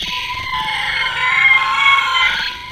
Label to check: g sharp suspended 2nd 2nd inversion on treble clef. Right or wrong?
Wrong